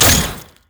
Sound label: spider; LoL; elise base